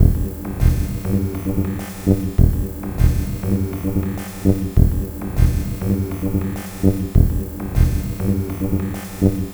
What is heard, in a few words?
Alpha Particle Beat, Composite Beats; Sonic Foundry (Sony Creative Software); Myths of Technology